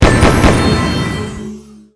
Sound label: card success; MuRummy; MUDevs S20.1-3